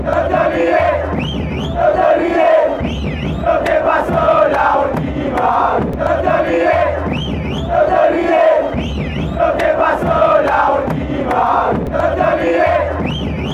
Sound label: huracan chant, fan chants